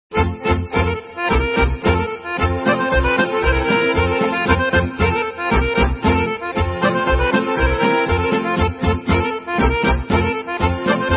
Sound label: Kolo Serbian